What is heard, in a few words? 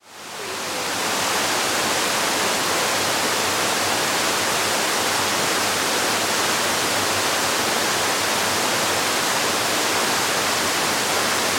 Waterfall Splash; Sound Effects Bible